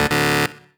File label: Mission Failed; Pac-Man Championship Edition 2 Plus